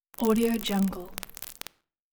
electric static sparks; hollywoodedge

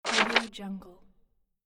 slide

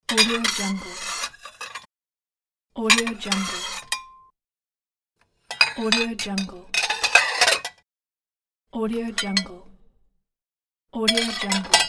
dishes

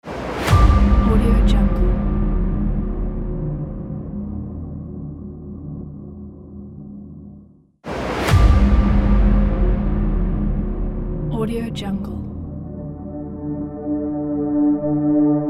epic powerful trailer

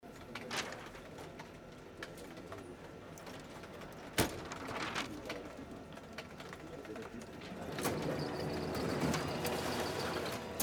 office; tineside

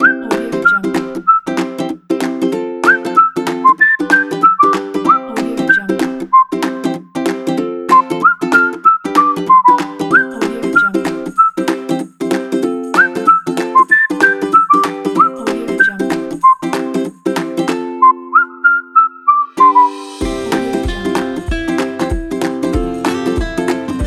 whistle kit; upwardmotion

